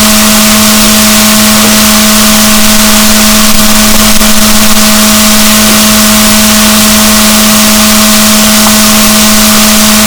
rorquals negatif, gaussian noise, BalaenopteraPhysalus Chile